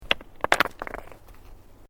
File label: Bricks Movement Minor